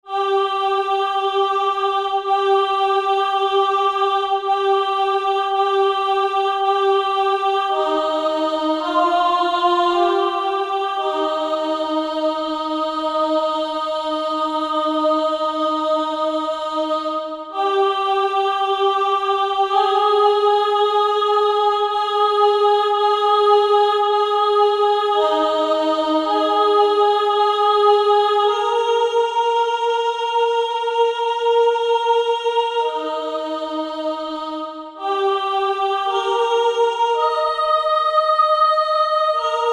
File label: sopranos; come here jesus if you please